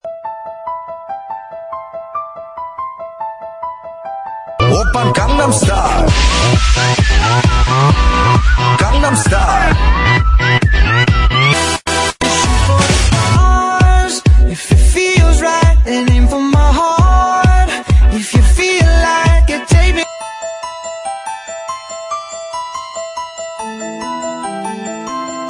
IPHONE 5 TONE RINGTONE

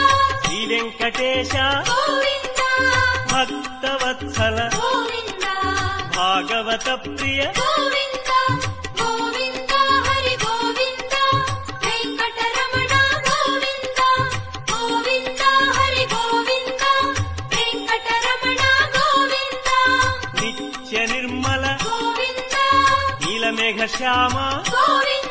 VENKATESWARA SWAMY TELUGU RINGTONE

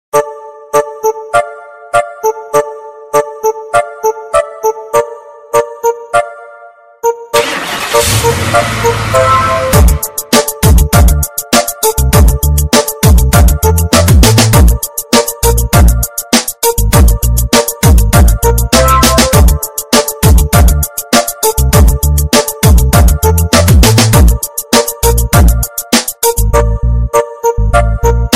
RINGTONE BASS MIX RINGTONE

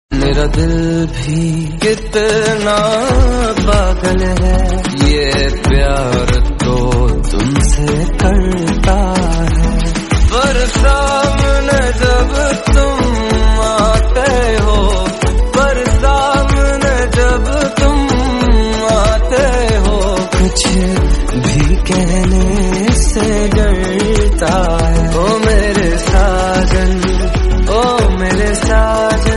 REMIX RINGTONE

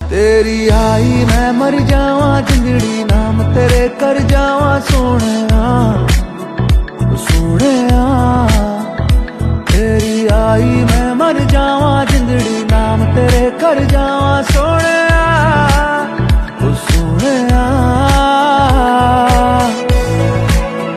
HINDI SONG RINGTONE

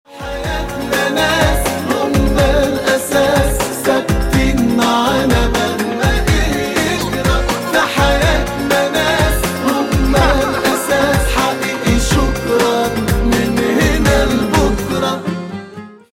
ISLAMIC SONG RINGTONE

